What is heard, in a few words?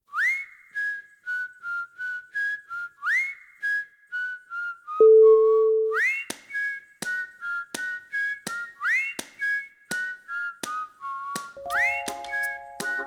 morning whistling